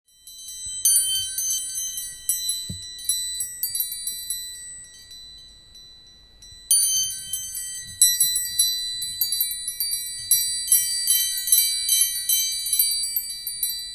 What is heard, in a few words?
doorbell; stier